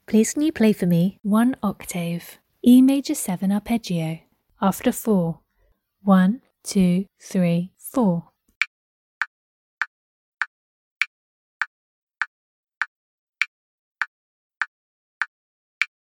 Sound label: e maj 7 arp